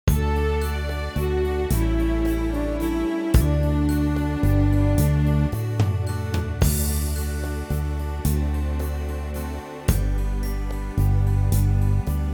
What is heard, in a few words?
backing; believe me if all those endearing young charms; folk